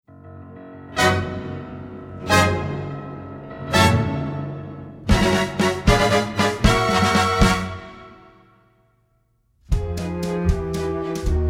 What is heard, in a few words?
waltz